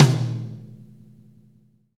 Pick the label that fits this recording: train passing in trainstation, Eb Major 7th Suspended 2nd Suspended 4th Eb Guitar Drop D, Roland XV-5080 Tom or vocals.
Roland XV-5080 Tom